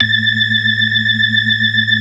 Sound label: BLOOD OCT R, ORGAN; Keith Emerson Signature Series